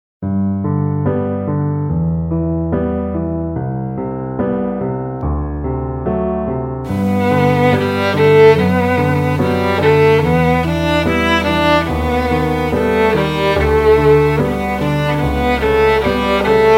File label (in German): kleine improvisation